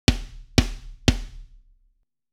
Kit Comp

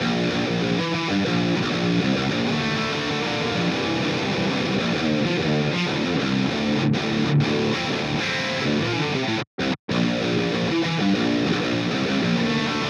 Guitar Dry to Amp

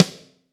Snare Attack Stereo

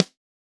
Snare Only Attack

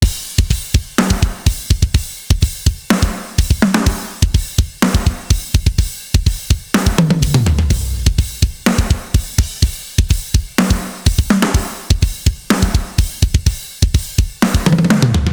filter Plus

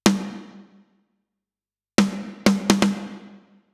Snare Process; Heaven

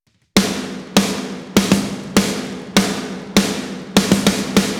SNR PROCESS

